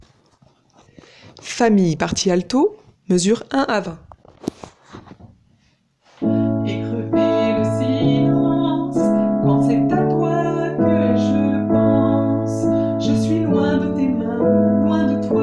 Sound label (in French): alto mesures 1 a 20; Famille